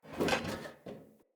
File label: Corrupted Battle Dog Foley Fill